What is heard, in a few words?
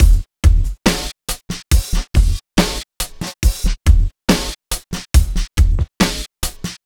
Slomo Beat 140 BPM, Loops, Slow Motion Beats